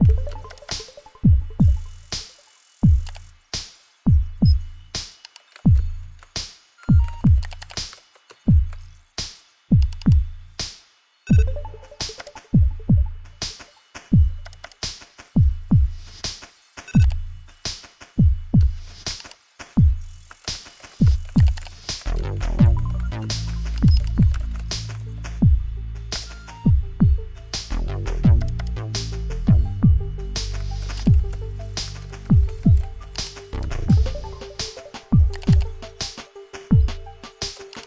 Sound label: ambience General; wild worlds